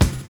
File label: SERIAL BD; Univers Sons